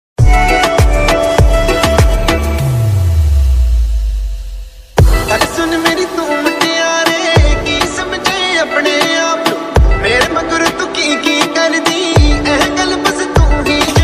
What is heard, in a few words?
Bollywood remix song